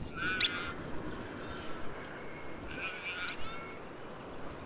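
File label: sanderling alba, birds in flight; heidarnes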